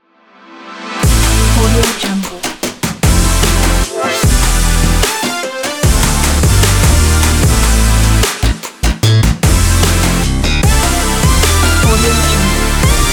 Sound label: action workout